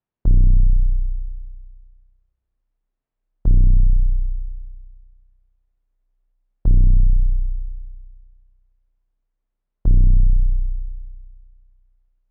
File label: 150 BPM B Min Bass, Trap; Cymatics; 2022 Melody Collection; Invader